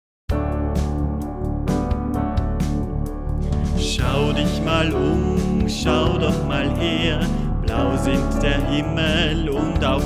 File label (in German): Bunt ist die Welt und wunderschön mit Gesang